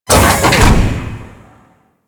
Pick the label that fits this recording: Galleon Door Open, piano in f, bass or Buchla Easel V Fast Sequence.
Galleon Door Open